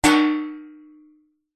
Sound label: impacts metal tray hanging suspended hit resonate